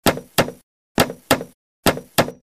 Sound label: hammer